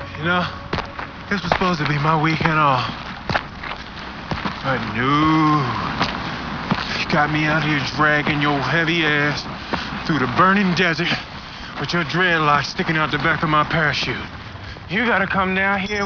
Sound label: BARBECUE